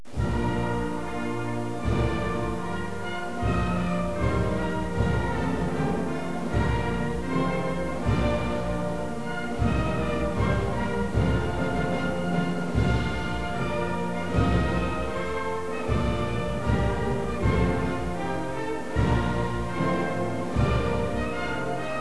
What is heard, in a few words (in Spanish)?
himno